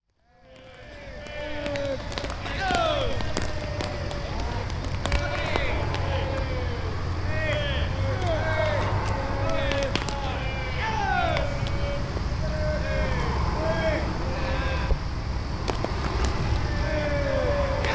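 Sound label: baseball; yakyuu; kikushima; Chino Cultural Complex ARCHIVES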